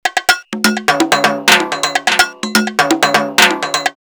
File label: 727 126, drumloops, DrumMachines; X-Static Goldmine